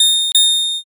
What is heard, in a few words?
bike bell